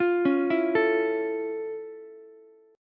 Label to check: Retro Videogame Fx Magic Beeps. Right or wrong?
Wrong